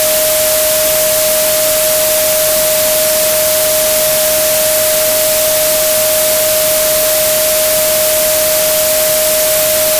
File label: Sine 622; 48k; PCM16; LR; dither; 0db